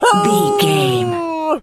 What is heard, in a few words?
wolf hurt